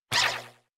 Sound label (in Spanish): Grito de Yamper